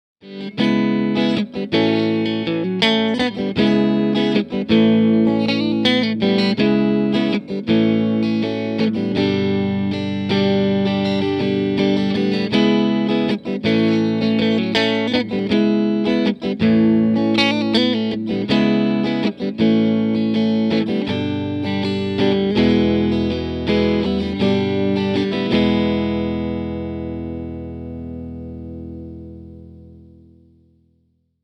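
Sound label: TC15 Channel 2 Clean Munch Hi hi INPUT Mexi Strat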